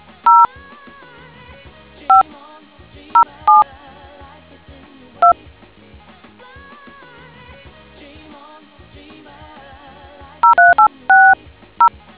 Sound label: dtmf